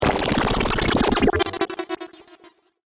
Stutter Digital, Production, Element, Stutter, Digital, Descend; Stutters